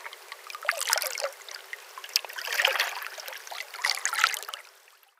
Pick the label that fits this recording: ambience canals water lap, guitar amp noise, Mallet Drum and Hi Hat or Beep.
ambience canals water lap